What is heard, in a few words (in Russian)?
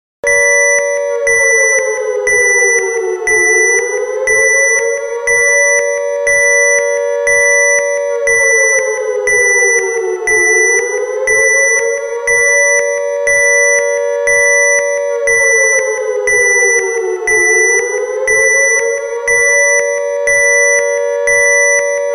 taimer atomnoi bomby i sirena